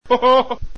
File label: jojojo